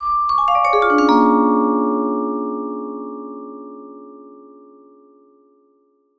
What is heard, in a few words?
VIBE LICKS